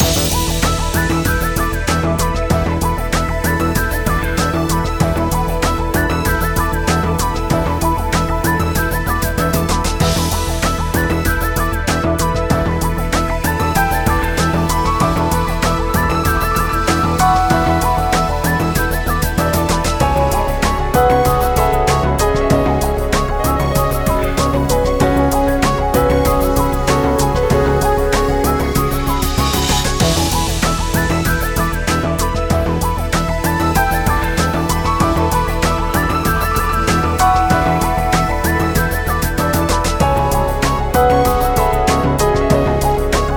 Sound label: Sparkle loop; PeriTune Material